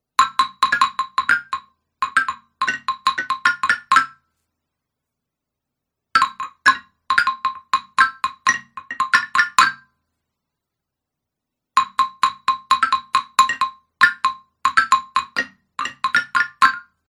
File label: xylophone